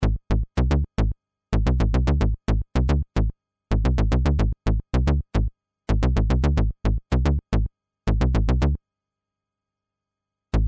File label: Filter Bass